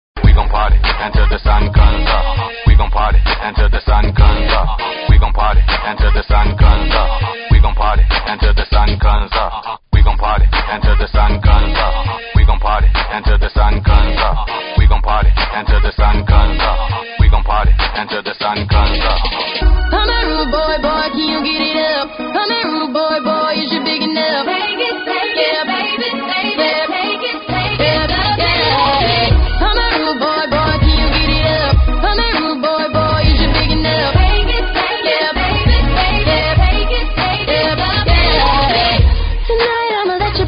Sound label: funk; multitrax